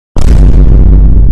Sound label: vine boom sound but bass boosted